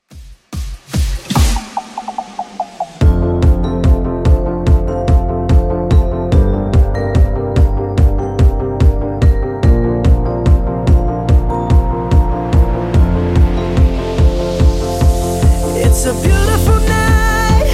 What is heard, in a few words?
2ks Redrum 145bpm